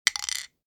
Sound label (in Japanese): saikoro